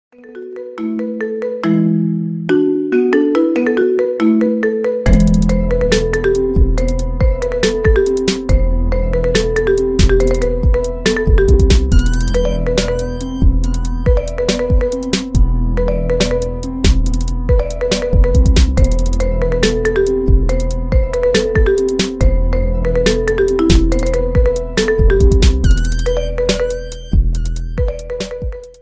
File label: marimba ringtone remix